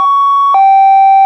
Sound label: hilo, sirens; code3 mastercomb